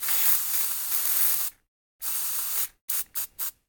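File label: HAIRSPRAY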